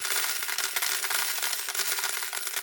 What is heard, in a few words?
Big Win Coin Collection; netent; eldorado; gonzo